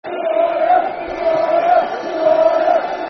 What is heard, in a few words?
Who Are Ya, Football Chant (Soccer Song), N. Ireland